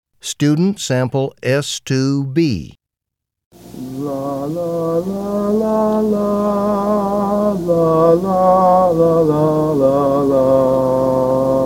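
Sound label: music ss2 sample, sightsinging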